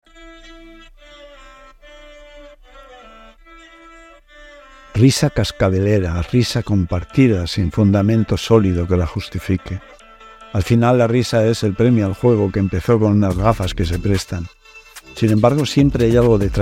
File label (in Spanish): la risa